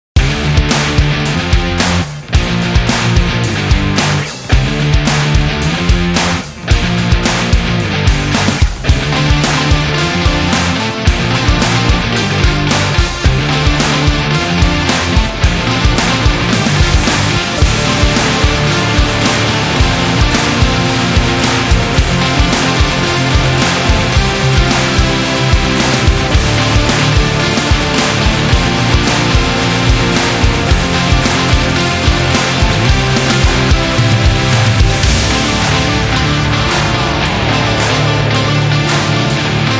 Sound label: Upbeat Rock Sport Music